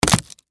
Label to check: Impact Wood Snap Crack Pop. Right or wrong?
Right